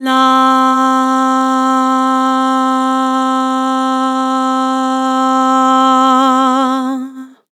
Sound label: Vibrato "Lah", Harmor Vocals